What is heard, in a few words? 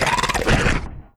war die; FLEMGUARD